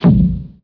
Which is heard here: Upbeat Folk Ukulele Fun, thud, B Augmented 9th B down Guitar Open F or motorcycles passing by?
thud